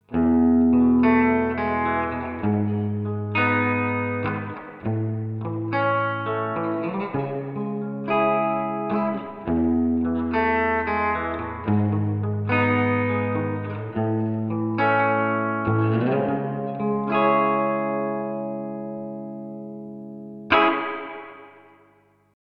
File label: Meris Mercury X Spring